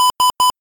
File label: Three Beeps